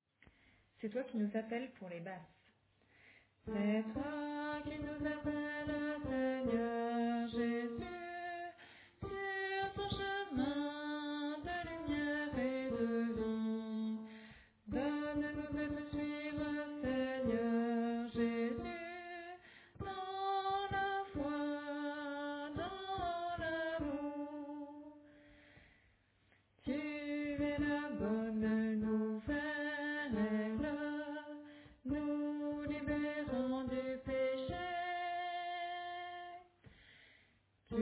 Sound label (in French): Basse